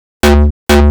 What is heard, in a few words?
Off Bass, Afterhours EDM; Sonic Foundry (Sony Creative Software)